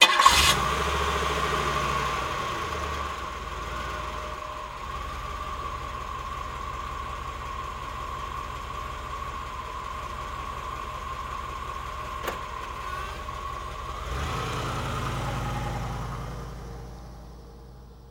Cadillac 1990 Exterior Start, Idle, Pull Away Slow Speed